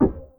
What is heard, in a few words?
perc, 100bpm; algorave